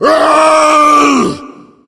tank fire; Left 4 Dead; Source Engine

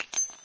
player shell; Source Engine; dod

